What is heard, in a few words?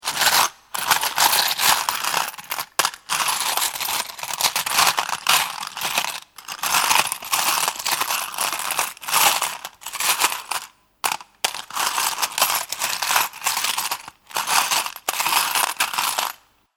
Searching through metal buttons in a box; Jangling; Objects